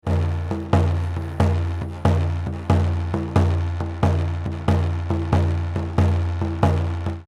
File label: da Dum da Dum drum pattern; chants Dane-zaa